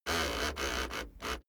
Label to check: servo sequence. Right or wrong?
Right